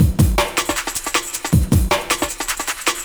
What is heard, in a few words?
Let Roll Break, Jungle; Breaks; Blu Mar Ten - Jungle Jungle - 1989 to 1999 Samplepack